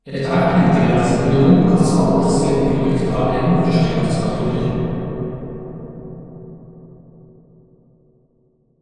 Katedral

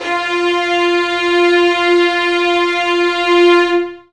fiolin

